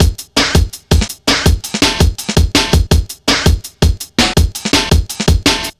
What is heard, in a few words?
Drumloops, 165bpm; Drum Bass; Zero-G; Total Drum Bass